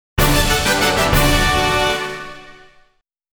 Received Battle Points!

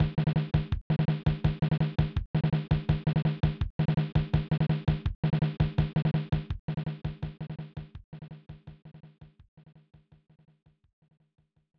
Drumbeat; fun n games 1994 snes

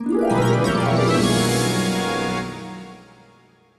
News Flash!; The Simpsons Hit & Run; ps2; Newspaper Loading Screen

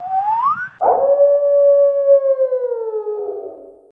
Level Complete; Halloween; Syobon Action Halloween Edition; Android

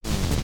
Door Close

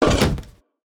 Dragon Lady Death Body

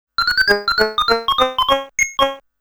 Round Clear MUSIC; Namco Classic Collection Vol.2; arcade soundtrack

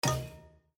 Savanna Error; windows 7

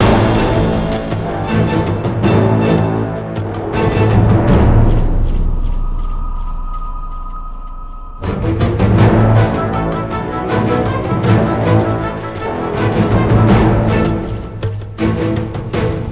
Chasm loop; Gnarled Forest; lego universe macos windows gamerip 2010

